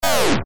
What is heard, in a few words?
buzz wind down